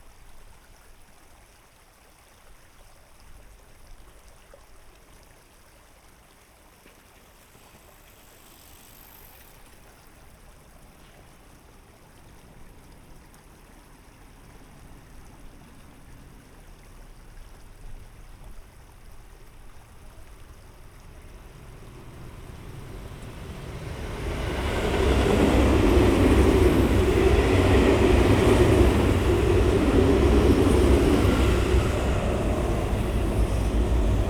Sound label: Binaural, walk; Field recrding walk